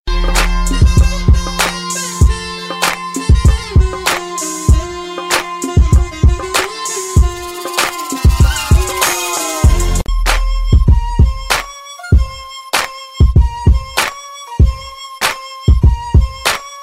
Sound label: Classical Hiphop Mix